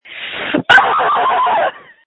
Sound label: Scream